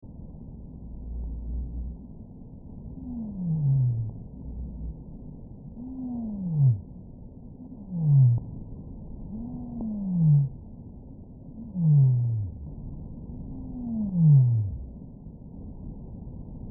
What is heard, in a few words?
whale sounds